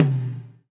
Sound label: GATE TOM, Drums Acoustic